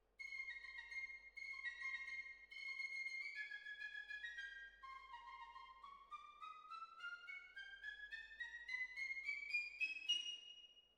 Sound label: Hall, Jazz for piano